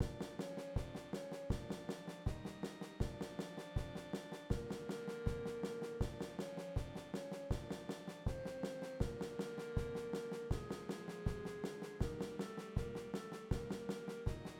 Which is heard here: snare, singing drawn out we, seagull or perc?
perc